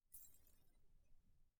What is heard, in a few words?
glass